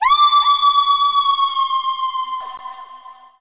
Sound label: fall girl; Nintendo